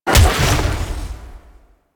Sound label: Melee Jump Short Slam Impact, Whooshes; Weapons; Warframe